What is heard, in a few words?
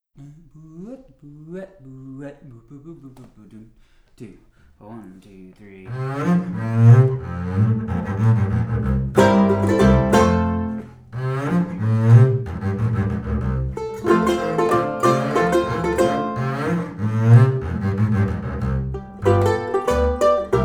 Bull Fiddle; Hollywoods Emerald City